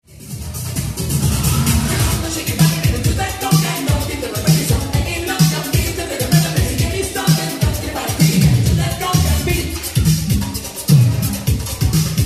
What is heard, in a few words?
Conga